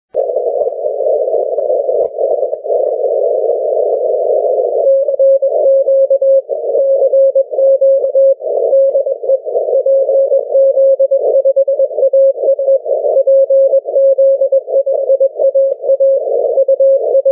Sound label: 80m cw